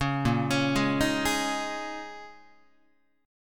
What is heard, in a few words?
A sharp Minor 11th A sharp down Guitar, chord; 4,6,4,6,7,7; arpeggiated; JGuitar